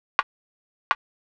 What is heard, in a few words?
HOUSE FX 125